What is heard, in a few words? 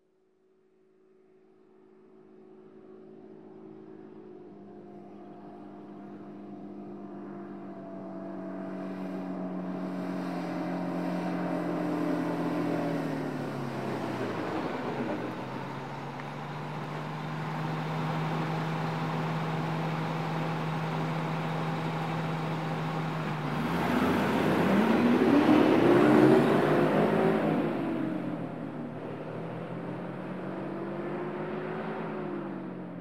traffic